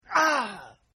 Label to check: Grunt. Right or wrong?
Right